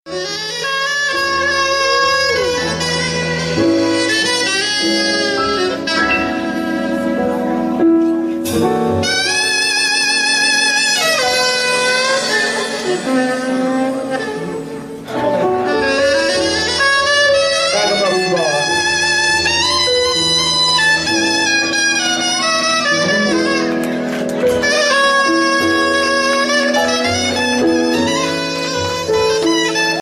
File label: Sax